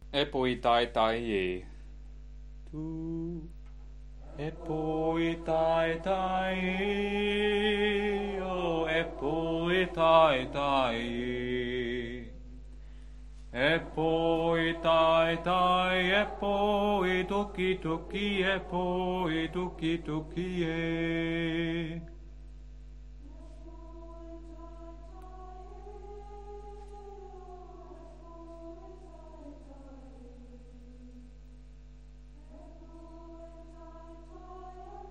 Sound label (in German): Bass